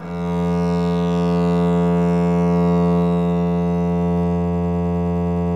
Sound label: DBL BASS, Synclavier Strings; ILIO